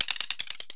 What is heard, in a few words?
game finishing level